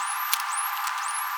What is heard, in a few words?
Whistle